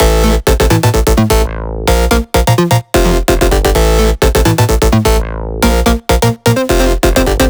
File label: 128BPM Melody; Vengeance Samples; VFH1 128BPM Fury Kit